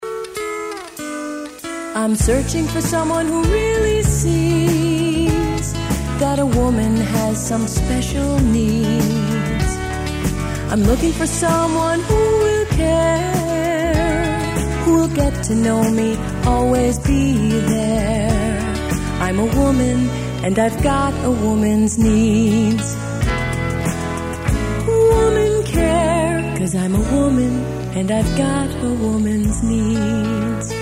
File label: Lovely woman's voice (singing)